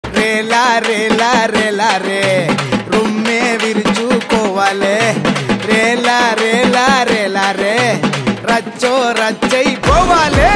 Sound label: Ringtone; Jagame Thandhiram Movie; Telugu Ringtones